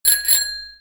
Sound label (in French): Sonnette de vélo